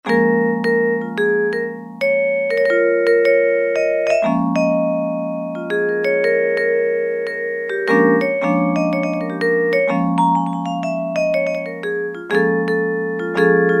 Vibraphone, Roland U-110; Factory Patch

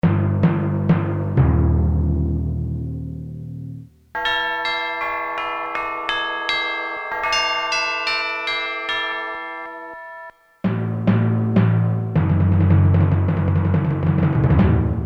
TMP & BELL, Ambient Drums, Timpani, Korg DSS-1

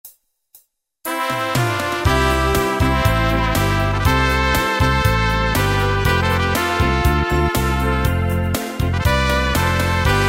Schlager Alp, Entertainer

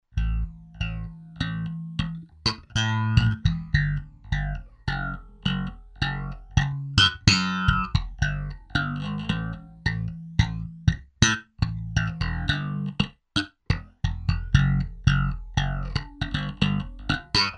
PHASE SLAP, Zoom 506 II

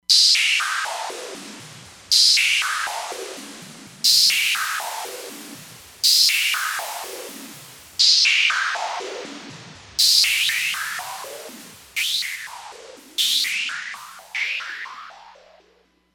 Lazer Points, Roland Fantom XR

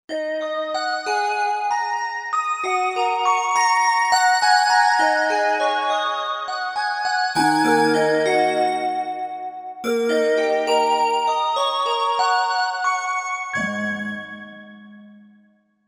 Glock Bell, Korg MSC-1S; Korg MSC-1S Variety

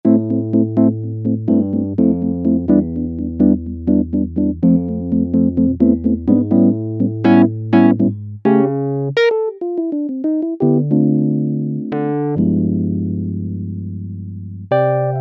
PIANOS and KEYBOARDS Whirly; Apple GarageBand 2

